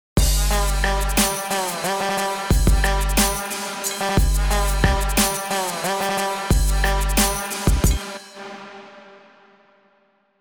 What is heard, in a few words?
Trap